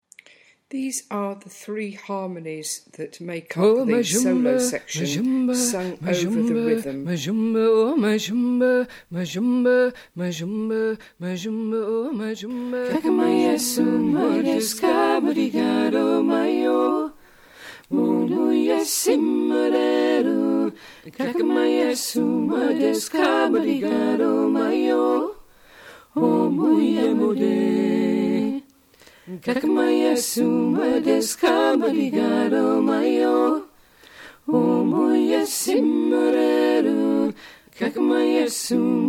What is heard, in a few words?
solo trio